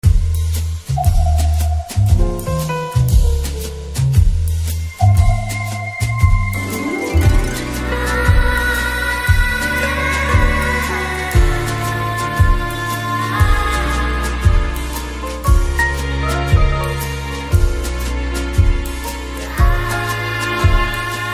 BGM Telugu Ringtone

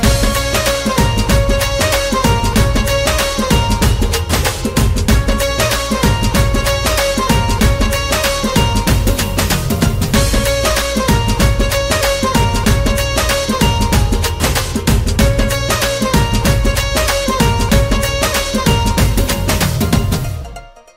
Drum Beats

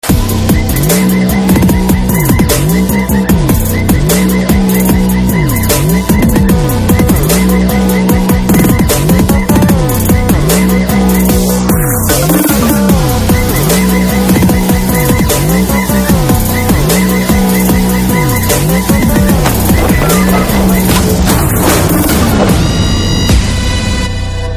BGM Telugu Ringtone; Saaho

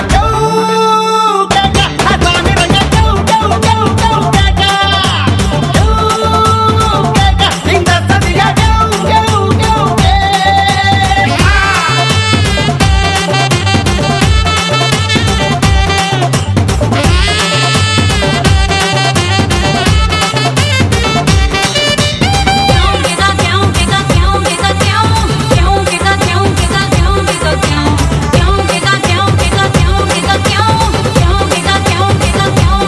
Male Bit Telugu Ringtone

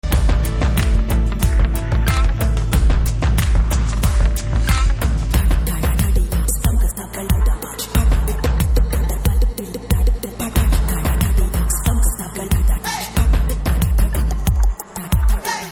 BG Telugu Ringtone

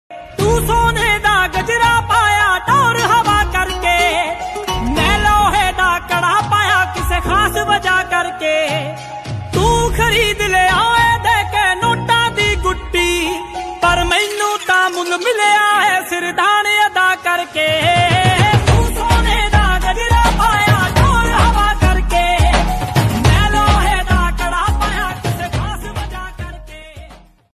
Punjabi Tune Ringtone

